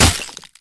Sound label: Impact Ice; smite; god merlin